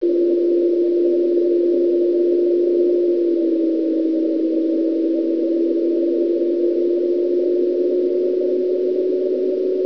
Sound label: beacon; AO-10 衛星; WAV フィルター処理後